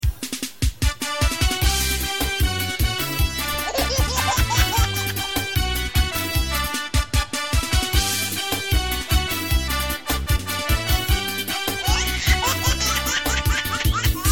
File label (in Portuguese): ao vivo arrocha